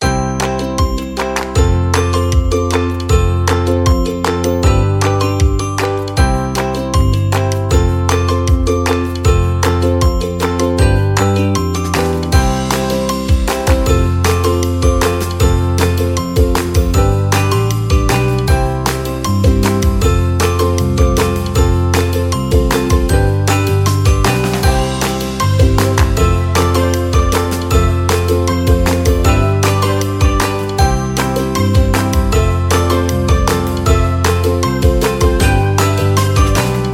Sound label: Upbeat Summer Fun loop